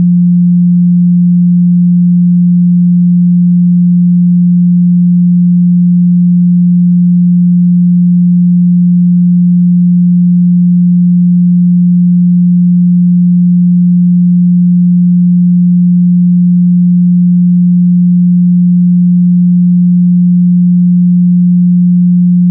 178 SINE LOG; SINE WAVEFORMS

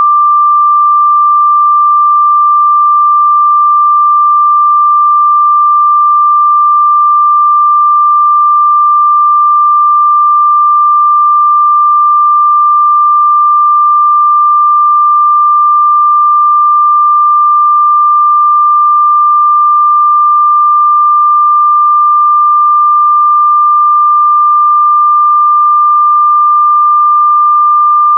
1183 SINE; SINE WAVEFORMS

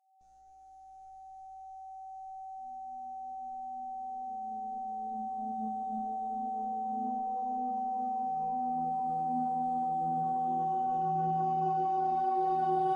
764hz; nervous system function

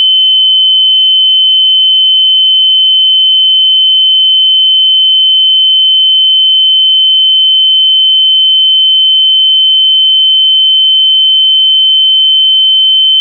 3059 SINE LOG; SINE WAVEFORMS

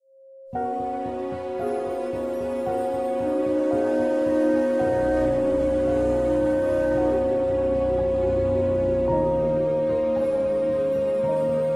537hz; endocrine function

